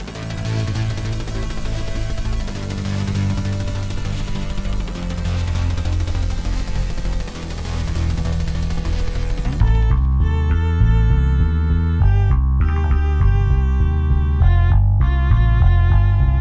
Lung Failure (Instrumental); Friday Night Funkin' Vs. Ralsei Smoking a Fat Blunt

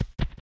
Alien herd loop

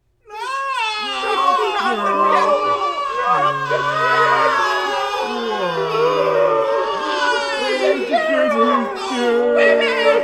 Cries; Gribble

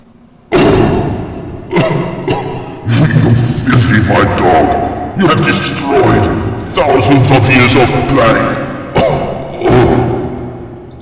Die

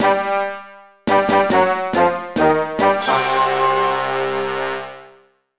Boss Lose; mario vs. donkey kong mini land mayhem; Super Skywheel

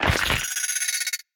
Death Bug; We Are the Dwarves

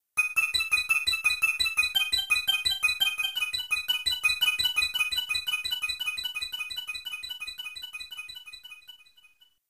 Logo (Arcade Sub Version)

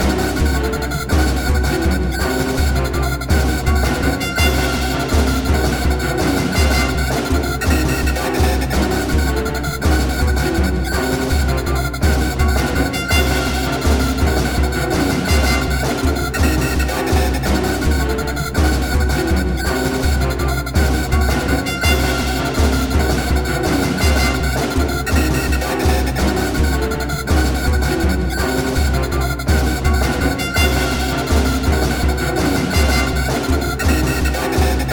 Music Stage2 Drill2 STEM; Plants vs. Zombies Battle for Neighborville; MajorProblem

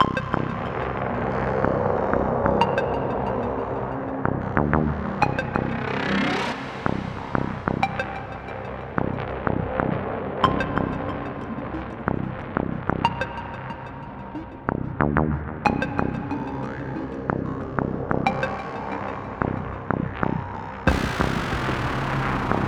bpm92; Zombie Rollerz; Pinball Heroes; Chapter 3 Map

